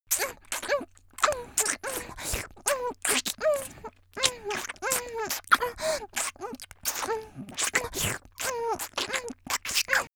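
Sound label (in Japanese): 少女「フェラ」ノーマル; 元気な少女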